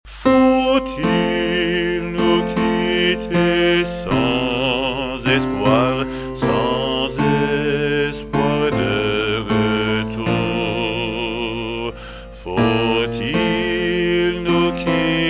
Chant des adieux

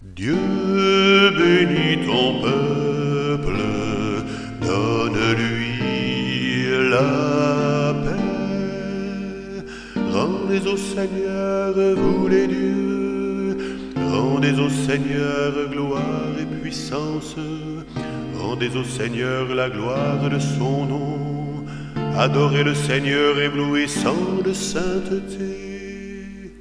Psaume 28 Dieu benis ton peuple

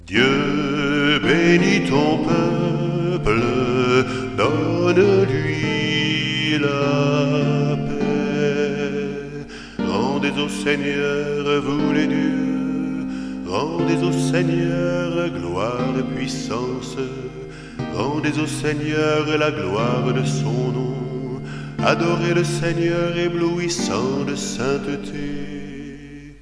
Psaume 28 Dieu benis ton peuple

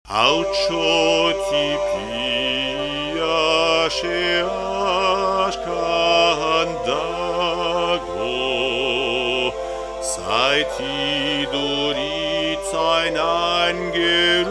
Berceuse basque

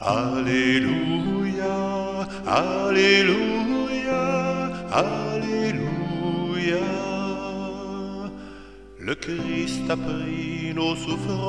Alleluia pascal

